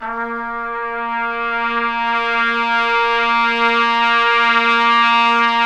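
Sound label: BRS TP SWE, Trumpets Swells; Roland L-CDX-03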